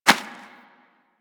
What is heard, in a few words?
Rodent Hop Step Rear Dirt, Forest Rodent Footsteps; Characters; Foley; Warframe